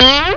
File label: fart